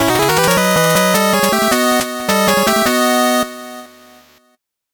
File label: 8bit jingle slow